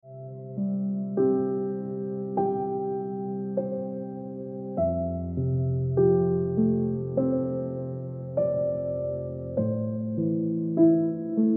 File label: Instant Ringtone Piano Music